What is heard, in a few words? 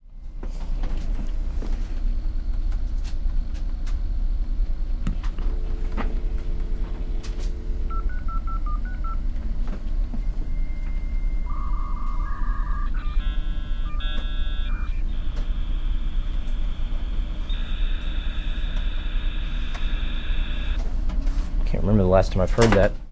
Last Dialup